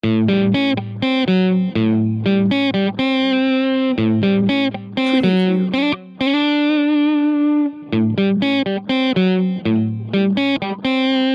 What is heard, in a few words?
curious development no drums